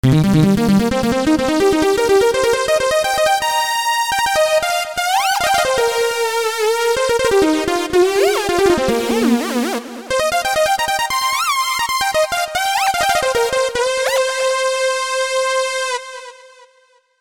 Screaming Leading; KORG OASYS; oasys assault

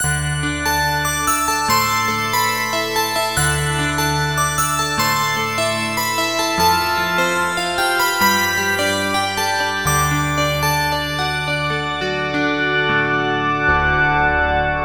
Twinkle Little Star; oasys assault

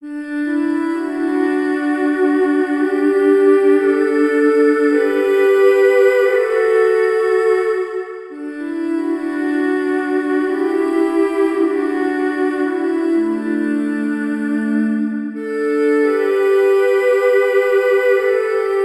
Mmmmm; oasys assault

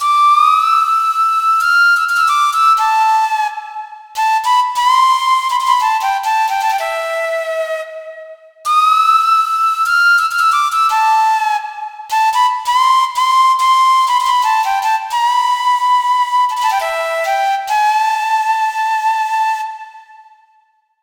Irish Flute; KORG OASYS; oasys assault

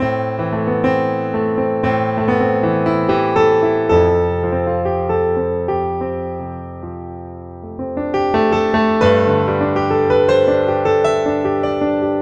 Piano Lounge; KORG OASYS

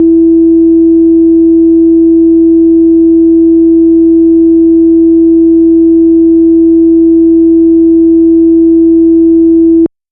organ noise

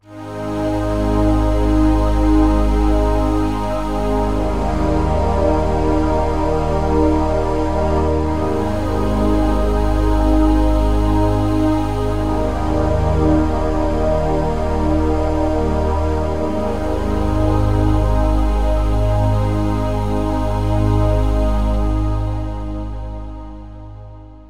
Air Pad; KORG OASYS; oasys assault

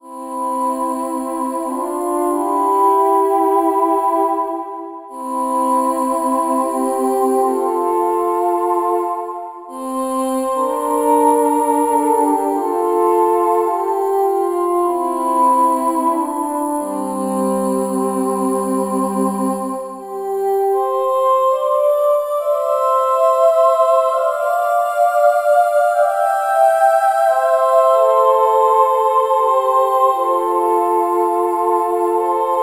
Ooooooh; oasys assault

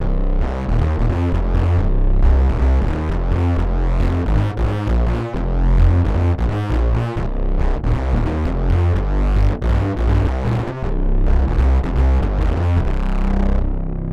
Matrix Bass, synth; KORG OASYS; oasys assault

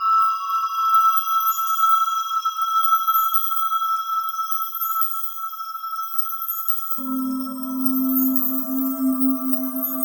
Crystal Wind Chime; KORG OASYS; oasys assault

